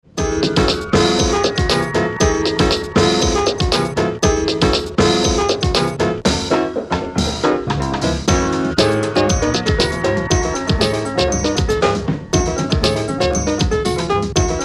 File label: Bom Bom Bossa Groove remix version